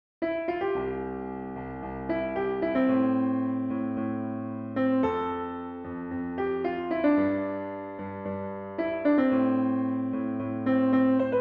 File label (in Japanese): ピアノ